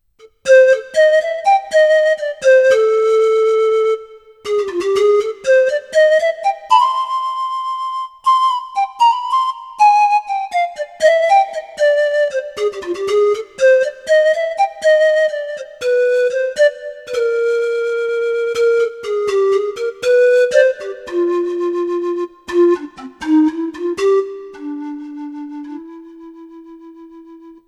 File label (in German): DP7U Panflöte